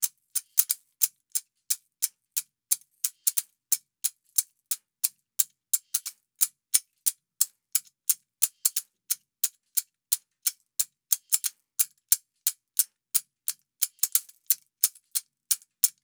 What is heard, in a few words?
shaker 089, Hip Hop India 089bpm; Hip Hop India Modern Percussion